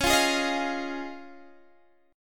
D sharp 7th Suspended 2nd Suspended 4th C sharp, Guitar